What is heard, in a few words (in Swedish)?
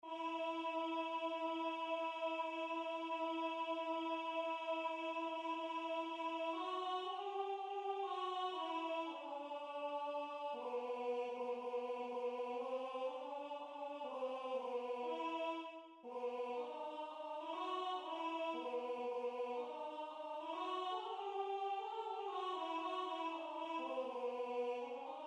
Uti var hage Alt